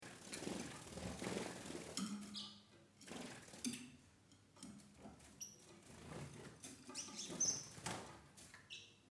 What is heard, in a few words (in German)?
Voegel